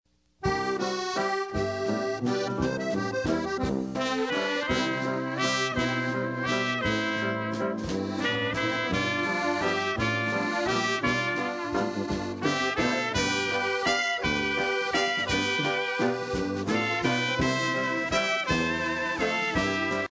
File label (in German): Walzer